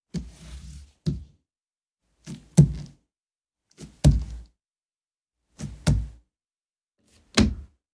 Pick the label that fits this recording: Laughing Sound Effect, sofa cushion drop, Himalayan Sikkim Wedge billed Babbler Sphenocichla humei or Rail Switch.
Rail Switch